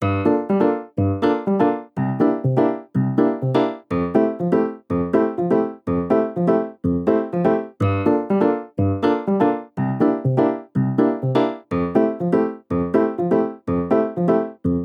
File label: CHORD